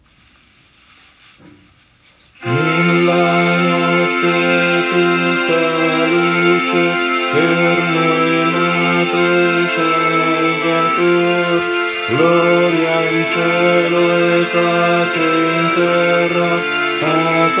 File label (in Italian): Contralti Bassi